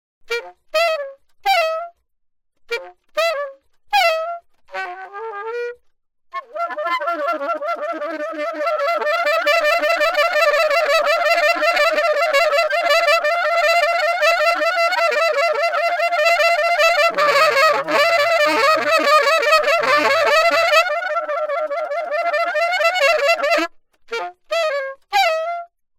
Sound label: altosax